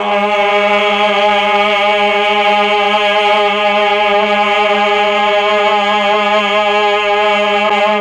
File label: CHOR; ILIO; Propeller Island Legendary M400